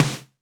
Snr, drum

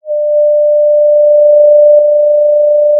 pop, Sound of a cruise ship horn

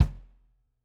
Kick, drum

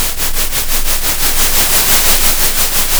pop, Sound of a train

